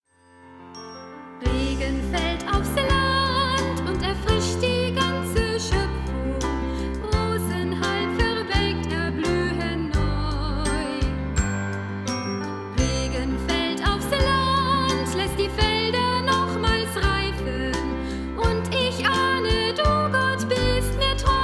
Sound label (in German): Kinderchor